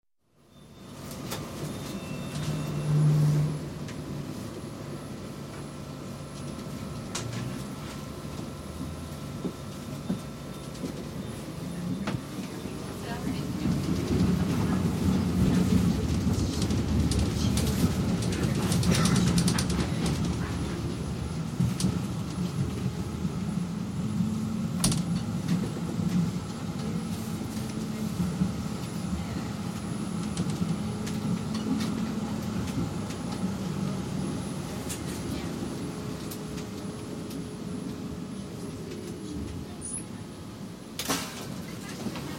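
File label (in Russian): proezzhayushij tramvaj vospriyatie iz salona, passing tram heard from inside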